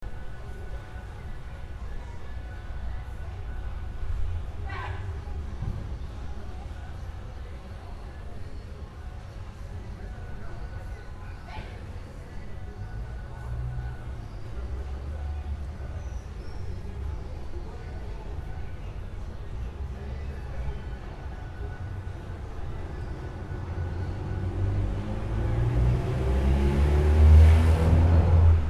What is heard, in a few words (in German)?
wohnviertel spielstrasse, verkehrsberuhigte zone